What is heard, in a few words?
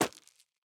stones, walking, pebble; Factorio